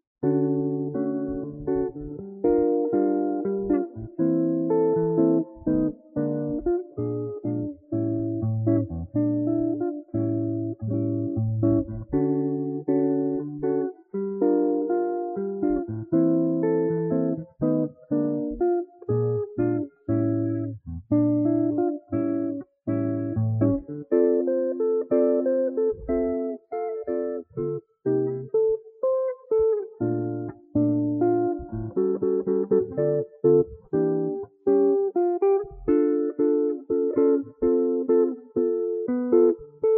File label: Jazz Guitar Solo